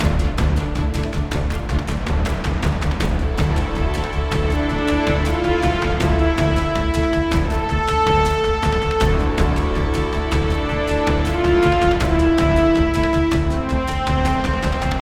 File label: Mood High